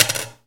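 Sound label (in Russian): пластмассовый прибор сломался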